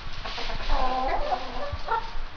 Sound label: chicken idle; svencoop; hunger